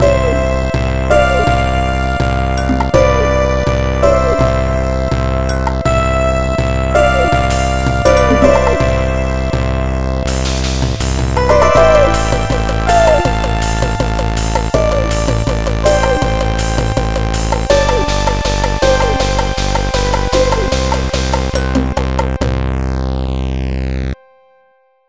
Bell is Ringing

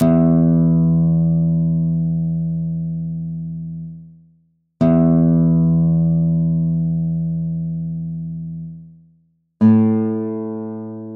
Tuning

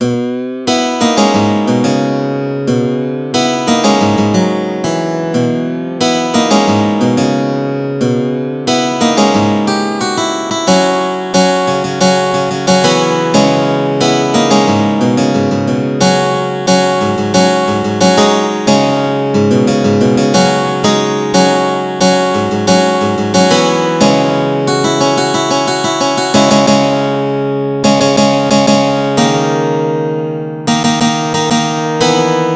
Guitar Solo

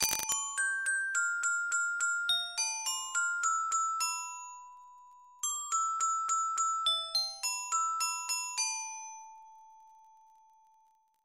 Music Box; Mr. Love Queen's Choice

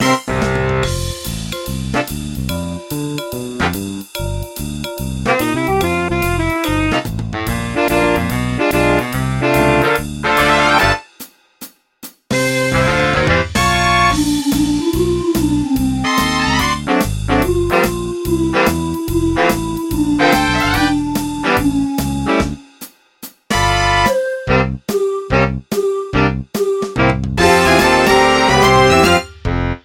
Karaoke Instrumental; Family Guy

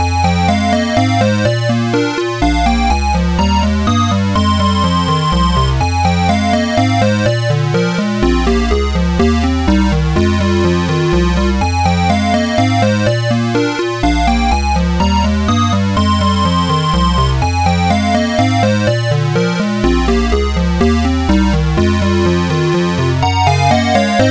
Game Over

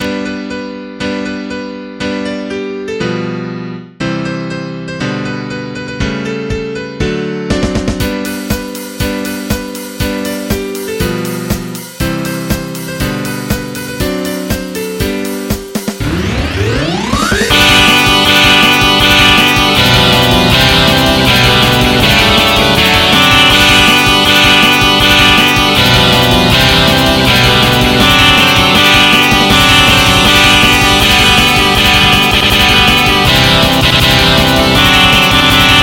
Jingle Freakin Bells

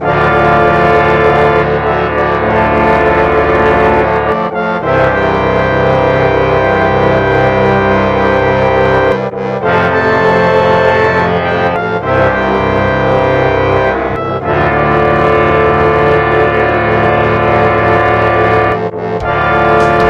Euphonium; Slipherette

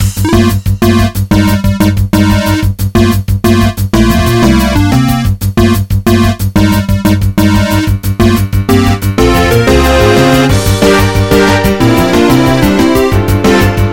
Enemy Approaching

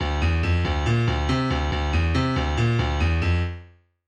Piano Riff

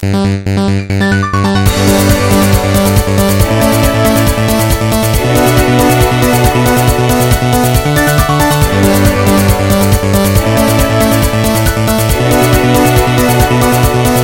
midi; nokia nocturnal

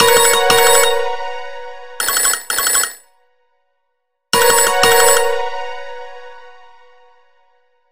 Glitzy

